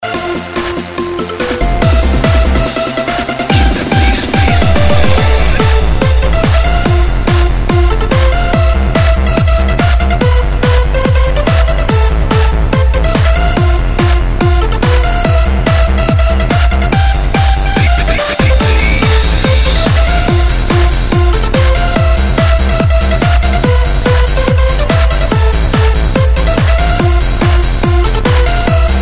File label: Bass Is Kicking